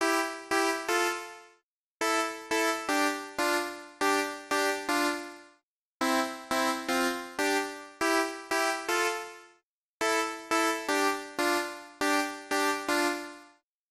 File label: 8 Bit, Music; 8 Bit Time Line